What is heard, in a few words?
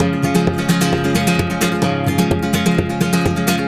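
130 C# rumba major, Spanish And Gypsy Traditions; Soundscan vol.59; AKAI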